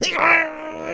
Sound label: push; Scrat